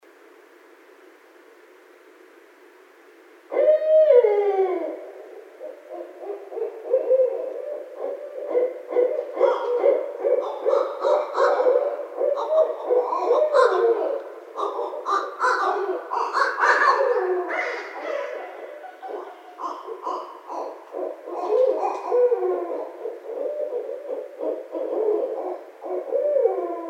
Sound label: Barred Owl